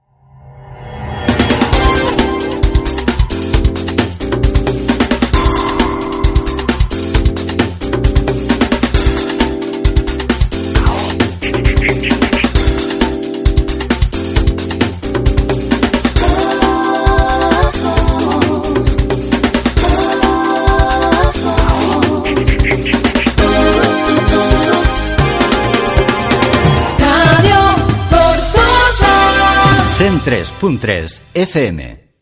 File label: JINGLE; Radio Tortosa; 2019